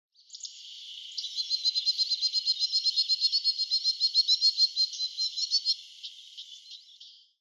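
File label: Stejneger's Stonechat; senjyougahara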